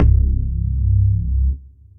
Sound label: Bass, brooding bass; Instruments; Corode Bass; Ableton; Mood Reel